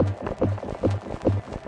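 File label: VOCAL OR VOXY LOOPS, 143 bpm; MELODIC LOOPS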